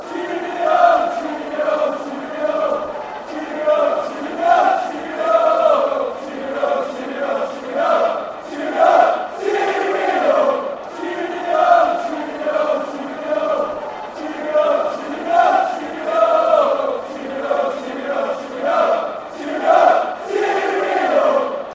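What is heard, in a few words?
cheerio, football songs and soccer chants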